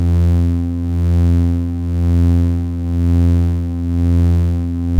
space Engine, futuristic weapons sound effects

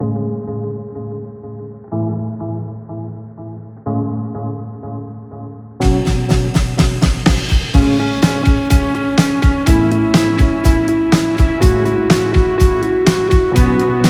Rock

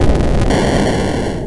Explosion, Retro Game Weapons Sound Effects

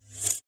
sfx, futuristic weapons sound effects

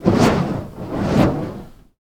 Fire Staff, Fire sound effects

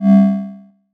force Field

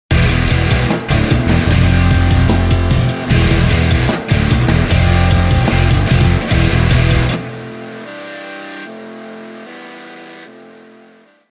Rock Intro

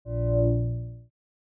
space shield sounds

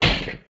creature misc

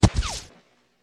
Fire Weapons Sound Effects bullet hit dirt

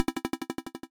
Retro bonus; Retro Game Weapons Sound Effects

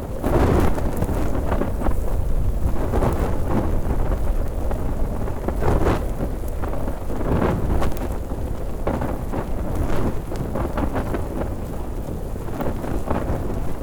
FLAME TORCH Fire sound effects

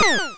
Pew, Retro Game Weapons Sound Effects

